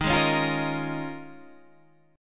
D sharp 7th Suspended 2nd Suspended 4th, Guitar, Open D